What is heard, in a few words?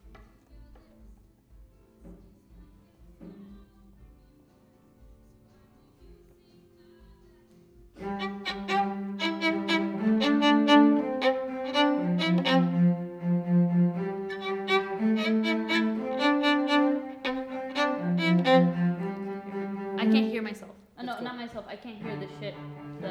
Violin